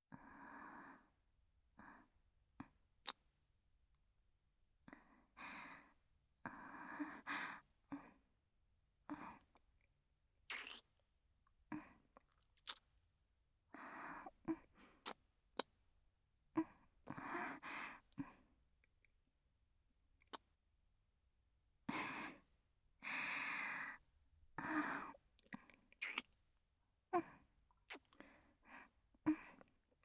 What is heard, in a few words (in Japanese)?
キス; SEなし